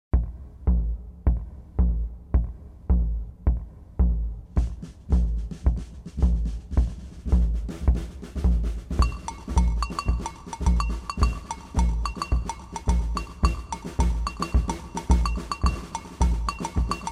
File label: agogo